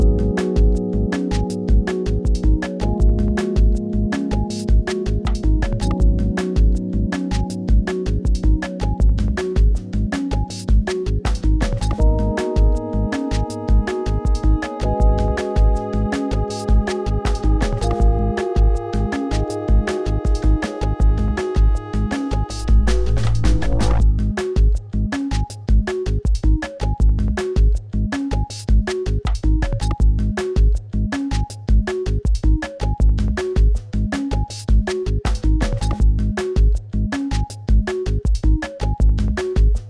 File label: longer loop; pseudOs